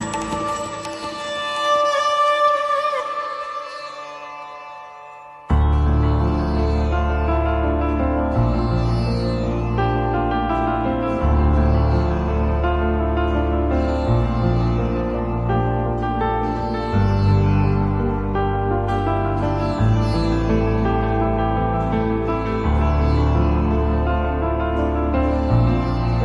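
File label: turk mix melodies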